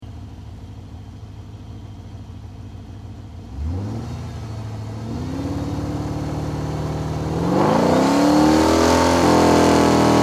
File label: Chevy V8 Hot Rod Engine Idle Then Accelerate Then Idle; Hot Rod idling, revving engine up to a high RPM, then back to idling.; Car Sound Effects